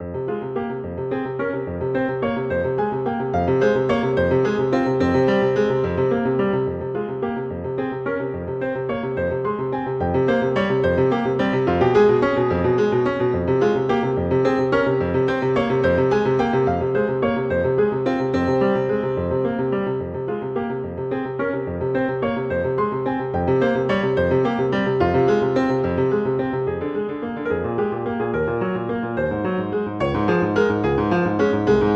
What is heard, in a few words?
Etude, Allegro molto agitato; Chopin; Etudes